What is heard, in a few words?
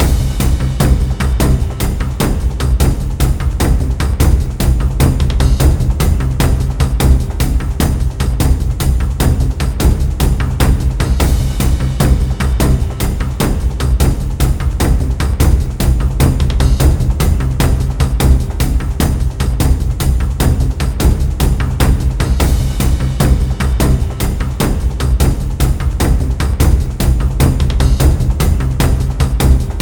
tension drum comp; gerilim; By Burak Sound Bank; tension gerilim vol 17